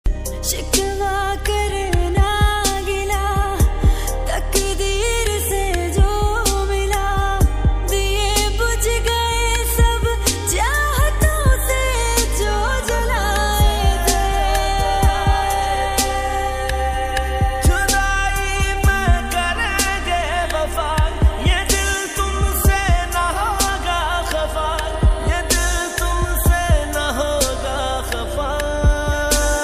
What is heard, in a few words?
Ost pakistani ringtone